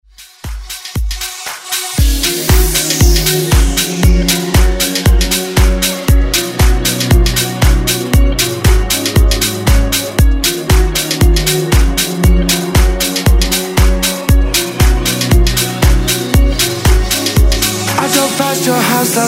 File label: Dance